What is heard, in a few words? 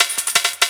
LOOP SD, Drum & Bass, 170 BPM; Ueberschall; Drum & Bass Flash Trackz